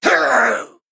Male Blood Elf Death

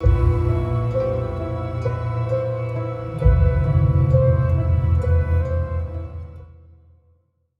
play stinger; YShaarj Defiler

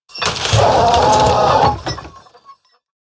Carousel Gryphon Death

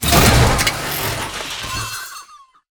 Mechanohorse Death Underlay

